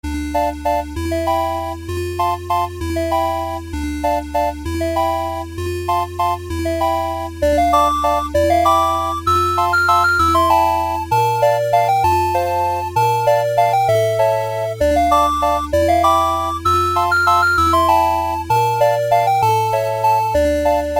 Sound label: 8 Bit; Windmill Hut